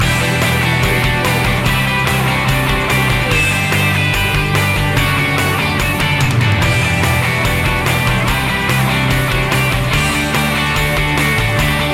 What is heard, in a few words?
pinkday loop